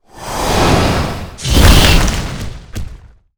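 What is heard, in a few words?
boss attack, monster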